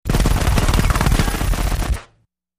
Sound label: Very Fast Deep Silenc, silenced